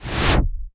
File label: cinematic impact wipe